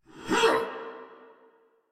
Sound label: Attack Effort; Warframe; Dax Knight; en